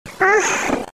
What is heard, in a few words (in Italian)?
urlo